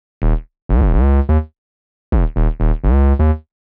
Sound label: 126 Eb C# BASS, Funky; Loopmasters; Funky Underground Vol 2; BASS LOOPS